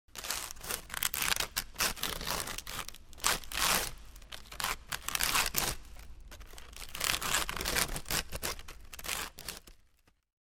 scratching

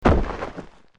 body falling to floor

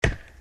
axe chop

